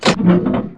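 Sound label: door start; KINGPIN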